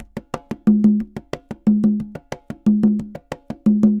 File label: Congas, Festa Latina, Drums & Percussion; Best Service; Festa Latina VOL-1